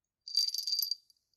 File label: collar bell